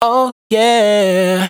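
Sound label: riff Oh Yeah B flat minor, Vocals, Dry Vocals; Raw Vocals; Slate Digital Omnivox Vocal Sample Pack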